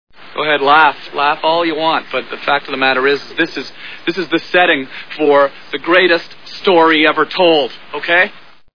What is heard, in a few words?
laugh; Road Trip; Tom Green: "Go ahead. Laugh. Laugh all you want, but the fact of the matter is this is, this is the setting for the greatest story ever to